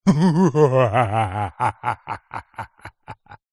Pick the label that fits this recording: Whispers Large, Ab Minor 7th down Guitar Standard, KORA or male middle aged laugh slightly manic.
male middle aged laugh slightly manic